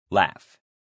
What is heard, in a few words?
laugh; to make a vocal sound when you hear or see something funny